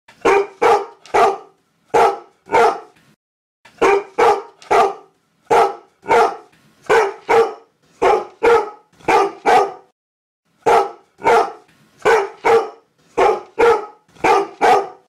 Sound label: bark